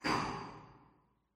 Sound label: warehouse brick drop; Half Life Alyx; rocks